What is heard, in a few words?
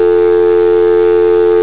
dialtone